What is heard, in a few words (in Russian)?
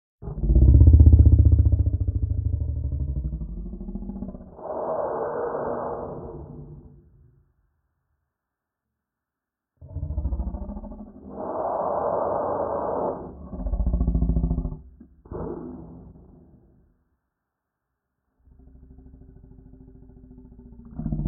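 звук монстра ужасов; territoriya proverki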